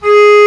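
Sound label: Bass Clar mf G#2; Digital Sound Factory Virtuoso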